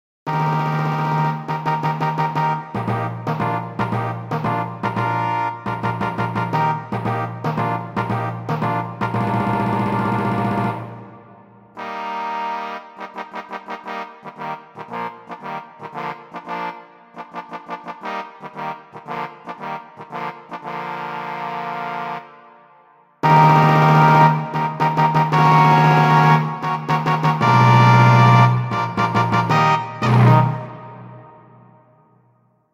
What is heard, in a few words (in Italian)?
fanfara reale; pifferaio magico; opera